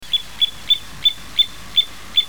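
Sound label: little ringed plover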